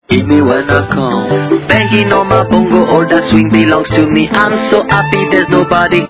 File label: bongobong